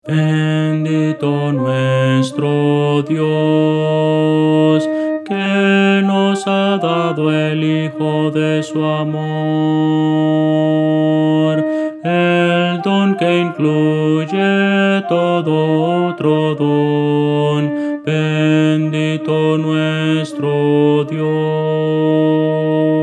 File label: contralto